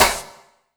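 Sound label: snare drum, Drum & Bass, 168 BPM; Ueberschall; Drum & Bass Resonance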